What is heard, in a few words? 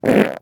short mid weak wet screeching barking explosive transient punchy natural cheeser; sonniss gdc